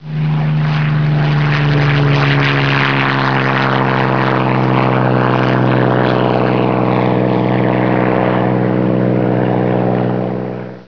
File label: Hurricane